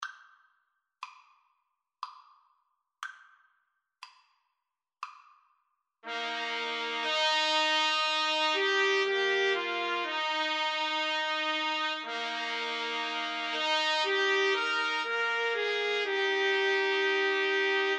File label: clarinet concerto, trumpet trio